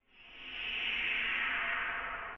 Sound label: Beam Main